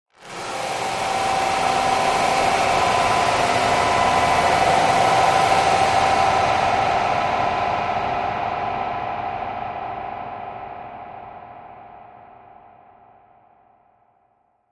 Feedback Horrifying

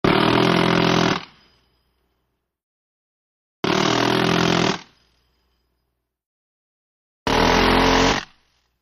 Vulcan Cannon Firing

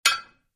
Anvil Hit Single Meta

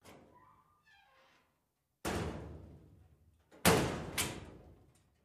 Locker Slam Mediu

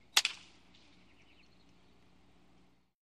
Baseball Bat Wood Di; baseball fx & games

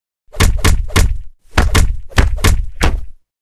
Block Punch Combo; punches kicks hits bones

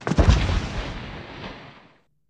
Dragon Falls, dinosaurs

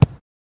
Football Single Kick; Football FX & Games

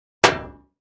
Impact Metal Sheet, flexing metal

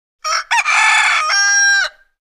Rooster Crow Typical

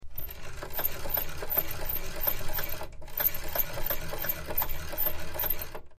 Knob; Circular Crank Turns Winch.

